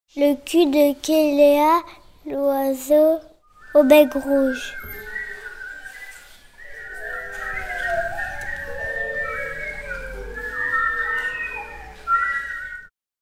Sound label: quelea